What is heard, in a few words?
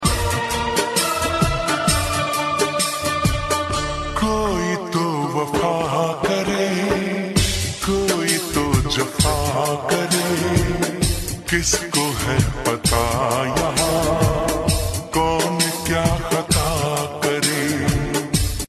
Slowed Reverb